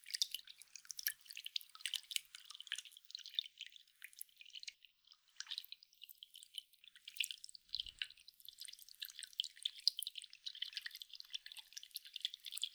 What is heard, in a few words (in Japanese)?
くちゅ, 水音効果音